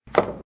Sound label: pocket, billiards; monkey2; PoolMod